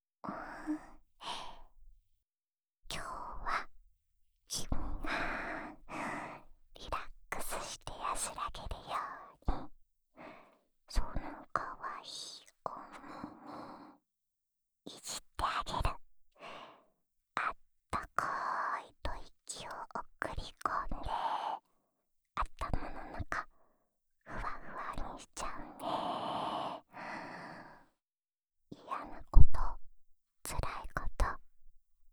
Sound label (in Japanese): 安眠用お耳ふーふーループ（右耳, ASMR